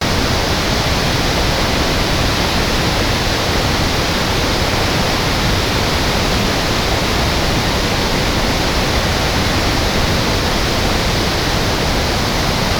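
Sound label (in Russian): звук большого водопада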